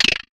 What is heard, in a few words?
Percussive Hit, contact mic